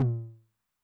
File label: High Tune Med Decay, 909 LOW TOM; classic machines; LT - LOW TOM